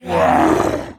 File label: Block UV Light; The Town Sam; Devour 2021 gamerip pc macos